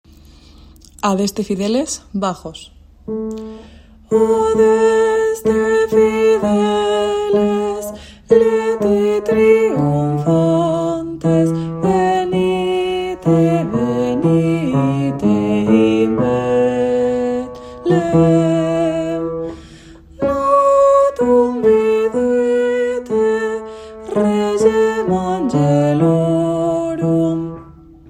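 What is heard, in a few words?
bass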